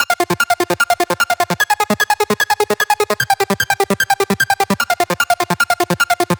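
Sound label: 8Bit 150BPM Arp Loop F minor; 8Bit Sounds; Antidote Advent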